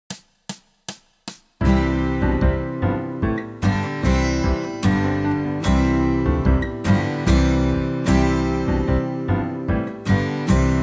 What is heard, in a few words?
Minus Guitar, Performance Backing Track; Deck the Halls